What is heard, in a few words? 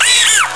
Wahoo; Arithmetic